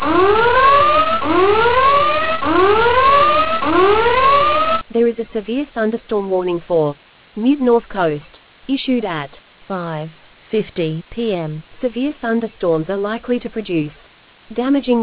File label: storm audio